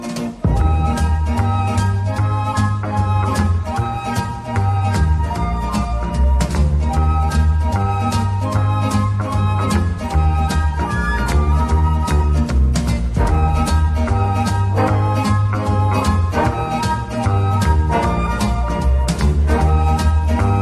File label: Hollywood Instrumental Music Ringtone